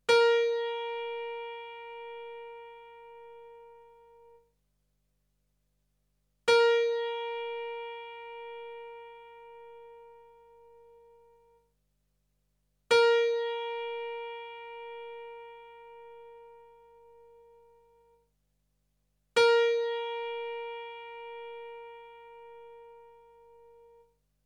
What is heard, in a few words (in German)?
Stimmton